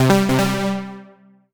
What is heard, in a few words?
MUSIC EFFECT Solo Warm Synth Positive; startide macos switch windows gamerip 2017